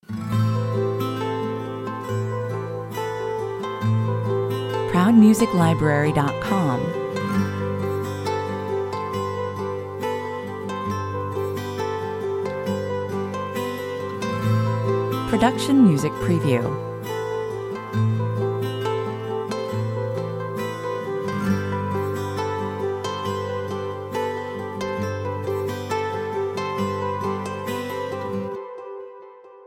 short acoustic version, 137 bpm

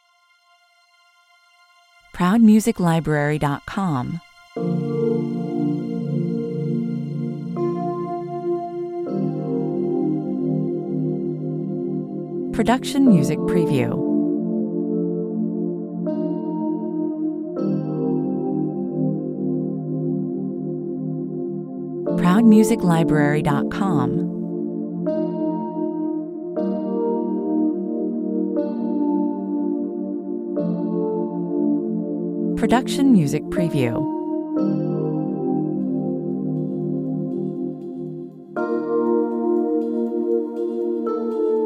wonderment 60 bpm

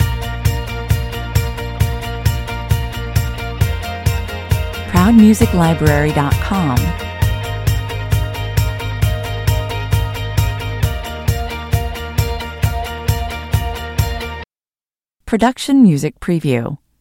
fast car loop

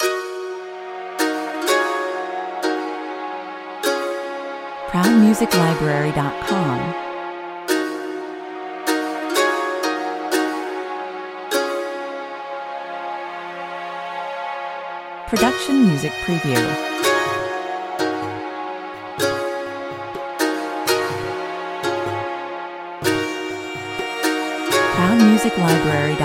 china morning 125 bpm

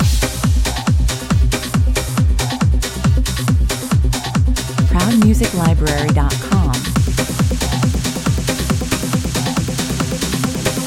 138 bpm, Trance progressive

